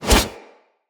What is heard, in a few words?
Sword Whoosh; Weapons; Swords; Dendrit Sword And Board